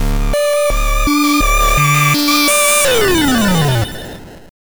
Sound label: Morph; animorphs gbc